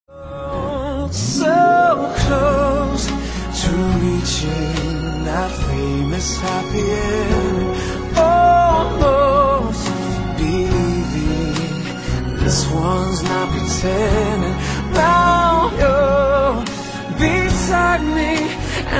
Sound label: chorus